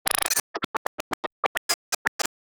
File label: modern slick and smooth, slick